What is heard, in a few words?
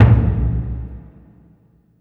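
Burundi drum, Heart of Africa; Spectrasonics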